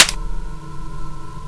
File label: clipout; awp; awpbuff; cstrike